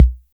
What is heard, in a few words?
808 K Short; Roland MV8800; TR-808MKS